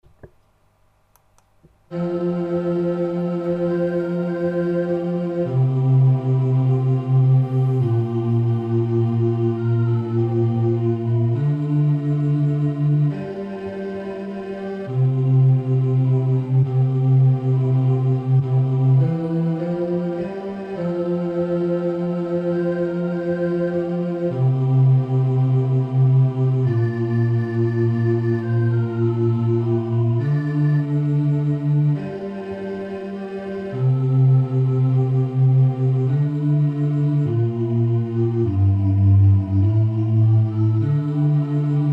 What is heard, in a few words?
Caresse bas